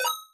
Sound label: success